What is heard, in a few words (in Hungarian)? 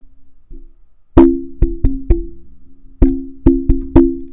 Radium 400 drum, mikrofon; Genesis Radium 400